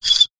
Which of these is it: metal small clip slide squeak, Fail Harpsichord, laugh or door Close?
metal small clip slide squeak